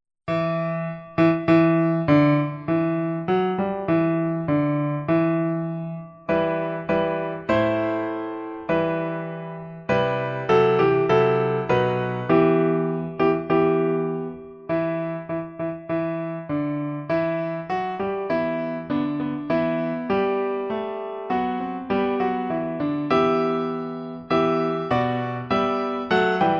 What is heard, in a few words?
piano